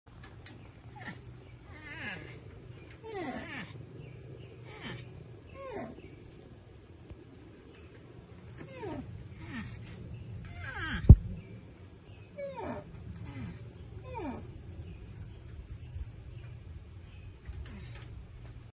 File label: crested porcupine; تشی کاکلی